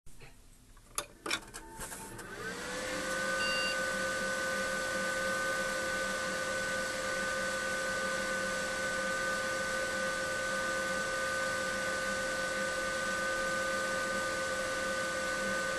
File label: studio beep